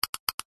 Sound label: technology computer mouse subtle double click